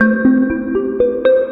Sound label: Melody, IDM